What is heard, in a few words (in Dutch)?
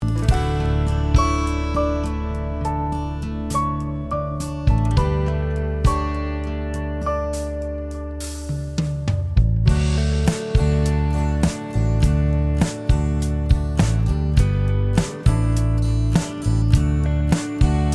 INSTRUMENTAAL; auditiemateriaal